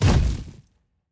atlas footsteps; smite; god atlas

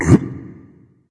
grunt lift; smite; geb

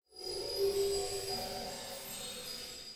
nightmare glide chimes; smite; thanatos

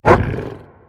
bark; smite; scylla

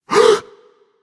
breath; Smite; baronsamedi

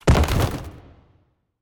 defeat bodyfall; smite; chiron

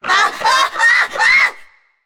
fear; smite; hades

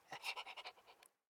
victory dog breathe; smite; god daji skin15

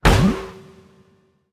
umbrella run impact; smite; vamana

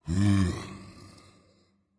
lobby landing; smite; geb

